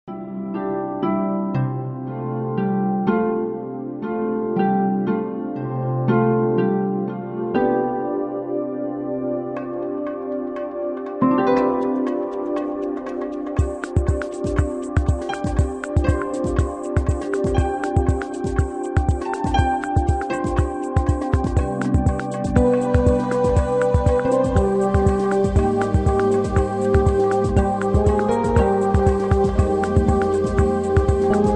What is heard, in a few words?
easy listening samba